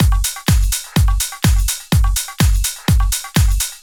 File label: Bells Whistles, Drum Loops, 125 BPM; Sonic Foundry (Sony Creative Software); Underground UK House & Electro